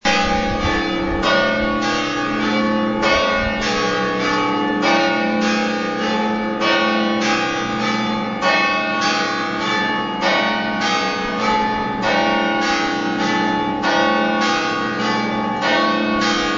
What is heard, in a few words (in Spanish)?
Campanas Catedral